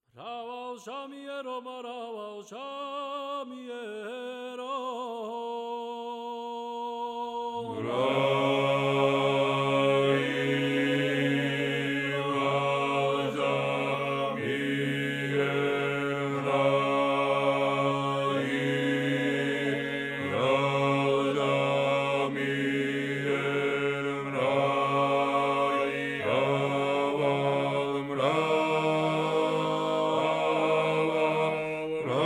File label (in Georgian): ასლანური მრავალჟამიერი მესამე ხმა